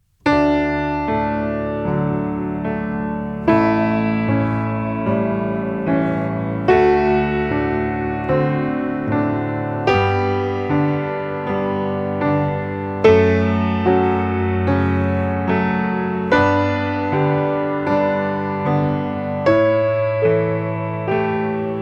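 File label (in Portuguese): Escala de RÉ Maior, Violino; Violino para os Pequeninos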